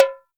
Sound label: can; Roland R-70; Perc